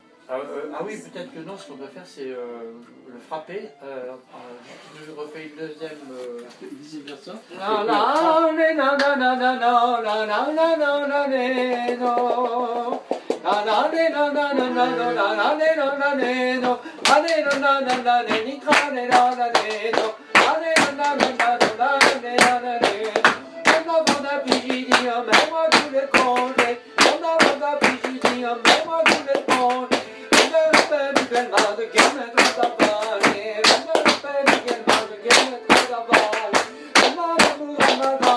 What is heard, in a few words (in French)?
Rythmique; Plinn